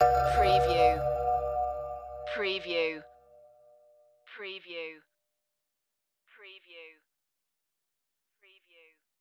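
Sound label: Creepy Gong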